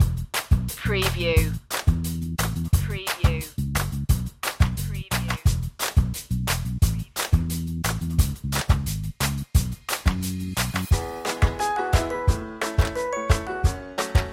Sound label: pop loop